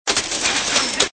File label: Get Money; bobtherobber2